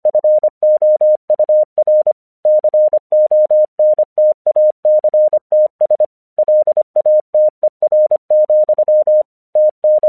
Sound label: English 25wpm